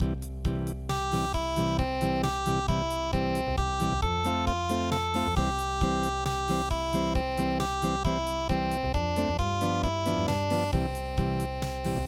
unpluged

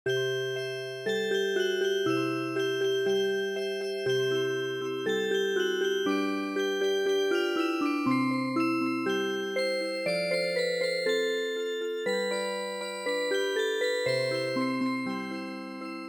unpluged Music Box; midi; traditional

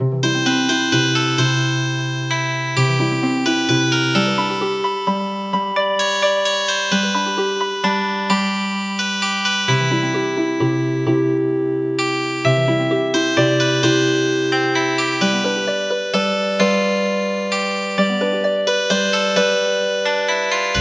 Healin Harp

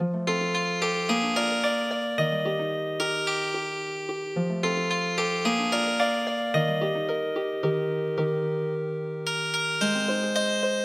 Healing Harp; HULA O MAKEE

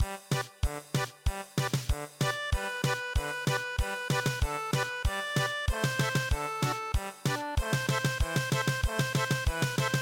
march German Polka; midi; traditional

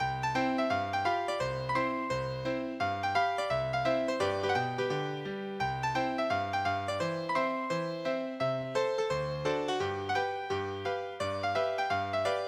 Rag Time

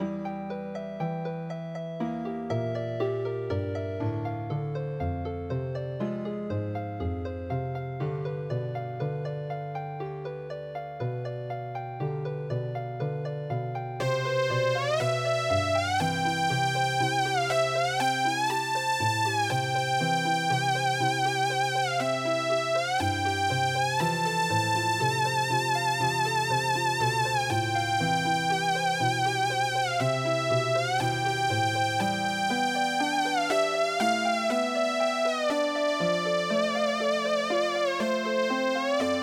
theremin

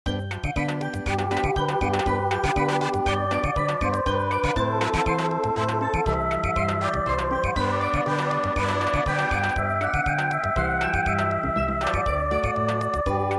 Samba, traditional; East India Nightingale

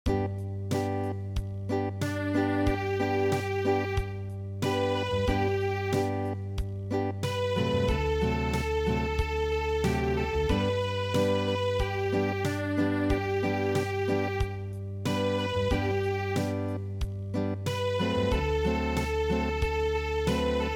Acoustic, traditional

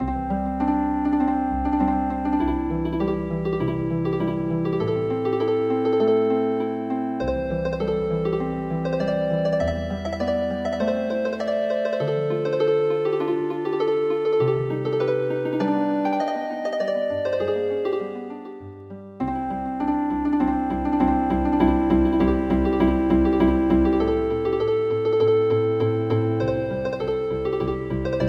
Healing Harp, traditional

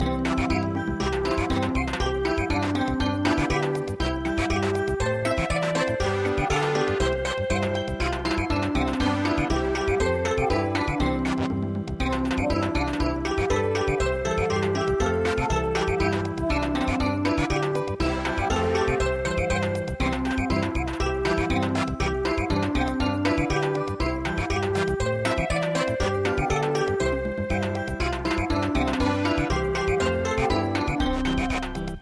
Samba

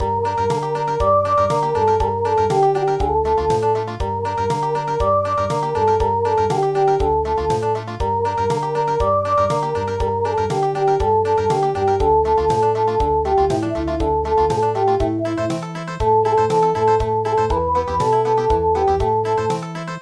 Country

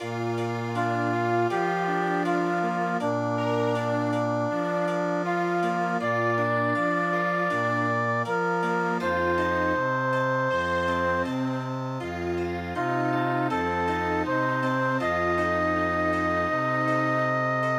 EVENING CHIMES Chamber Music

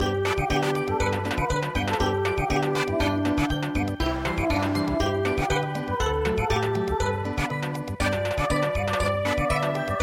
railway song Samba

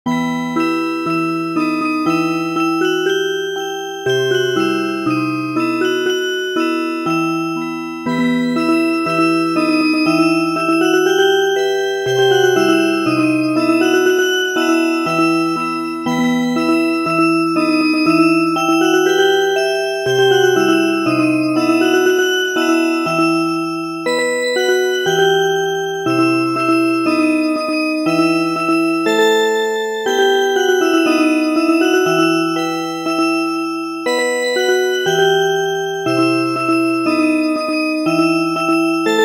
Music Box, traditional; midi

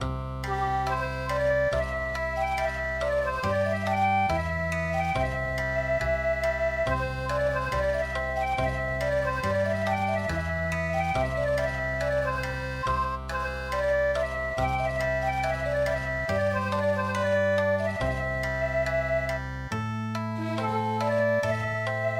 Okinawa

